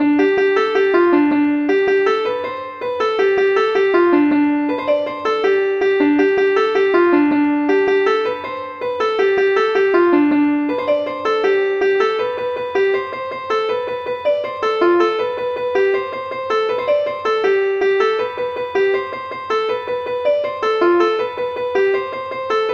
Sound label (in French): suite polkas